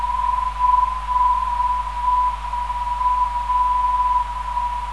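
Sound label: non-directional beacon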